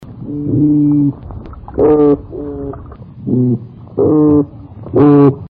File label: oyster toadfish call; chesapeakebay